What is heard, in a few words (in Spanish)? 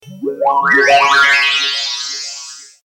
alarma; gps; logistica; alarmas